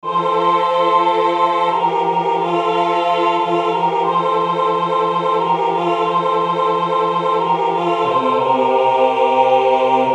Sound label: De Colores tutti